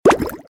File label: Underwater shoot